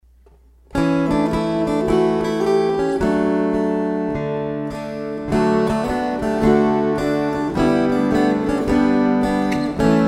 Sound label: String Choir